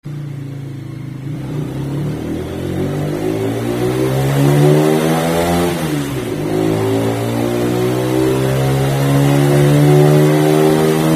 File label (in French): echappement; LEOVINCE; Type : JC34; Poids : 3.313 Kg; Niveau de bruit : 85.6 dB